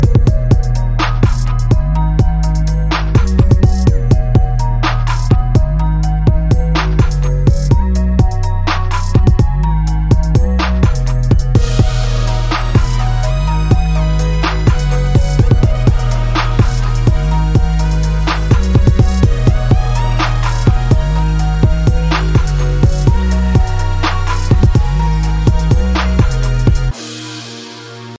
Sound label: dope beat ringtone